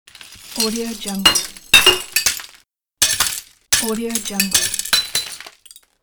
shards of glass

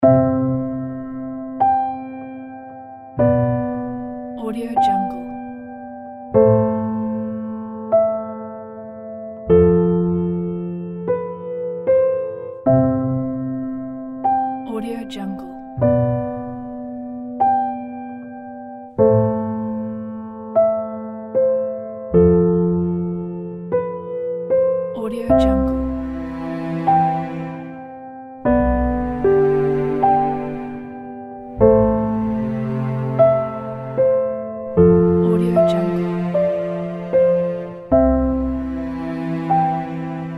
sad melancholic emotions piano music

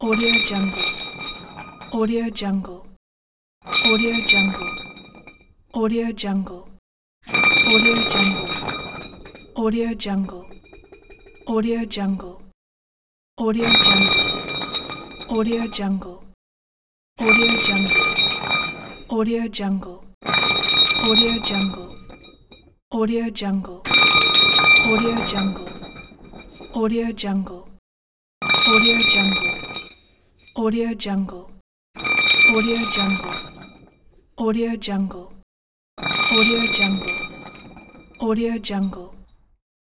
metal pipe short thick rolling

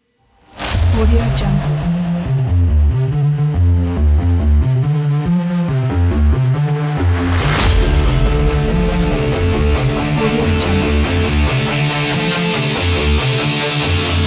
sport dubstep big beat

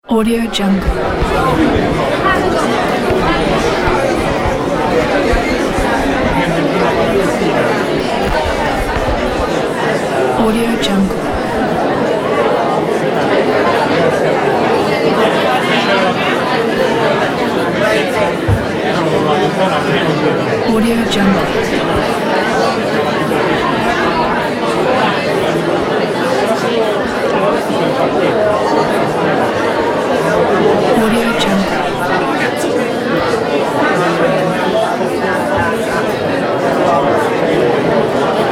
restaurant

